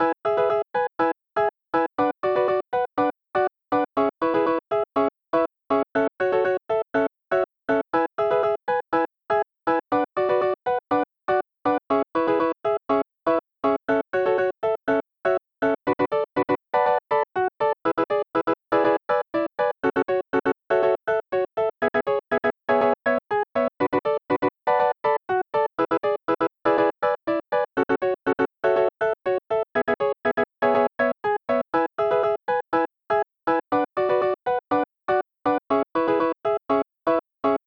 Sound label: square town